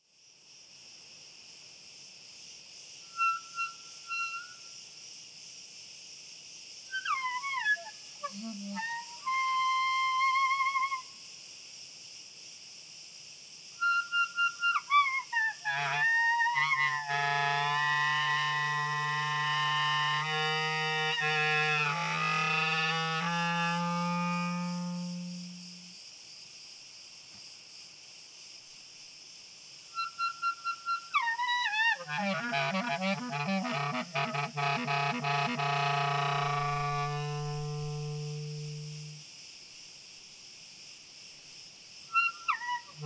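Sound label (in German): Grillen Frei Tief Hoch Rau Ober Ton Grillen Spiel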